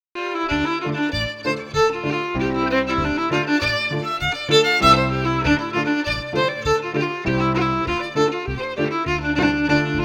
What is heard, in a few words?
FIDDLE FIDDLE